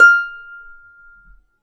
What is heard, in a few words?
F5 HARP; USB Soundscan vol.62; AKAI